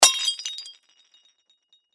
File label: Crash Ceramic Bowl Dish Drop Break; Crashes; Sound Effects:Objects; Blastwave